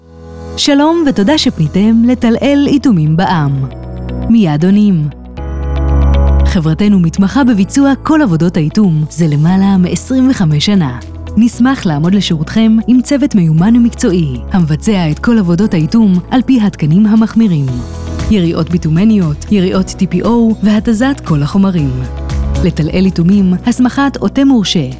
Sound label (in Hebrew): גינגל עסקי; טלאל איטומים